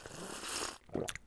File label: Sip Tea Swallow; Civ4 beyond sword